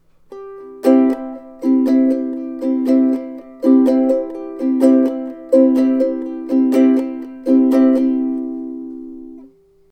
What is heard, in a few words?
ukulele